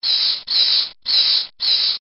bat effects sound

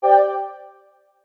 NAVIGATE STAB

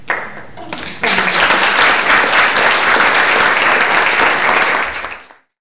Applause

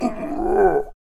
zombie gets attacked

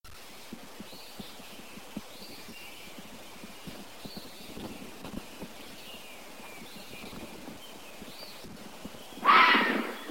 animal

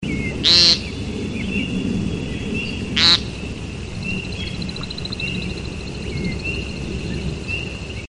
woodcock courtship

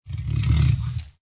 ANIMAL CREATURE

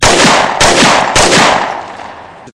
p90 gun

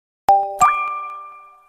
notification corta

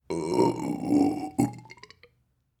Man Burping

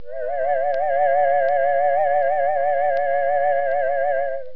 cartoon

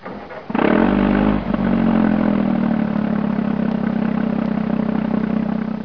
vehicle

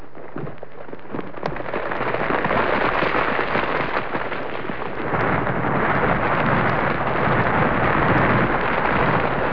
nature

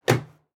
Car Door Close

